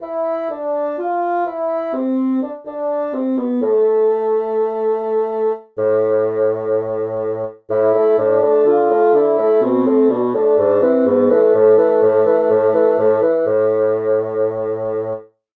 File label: bassoon